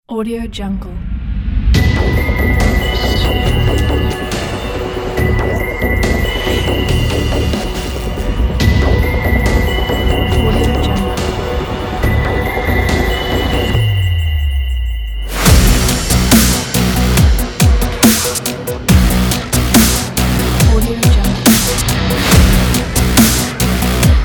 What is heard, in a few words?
chilling blood trailer